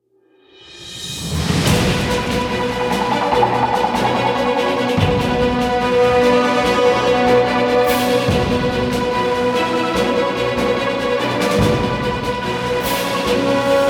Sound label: Combat Dogfight; Elite Dangerous